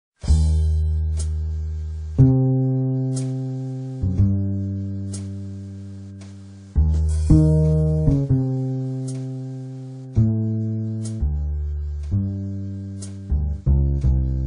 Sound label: Backing, jazz